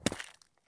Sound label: run asphalt; Escape from Tarkov